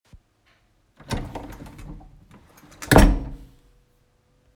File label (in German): drittes Geräusch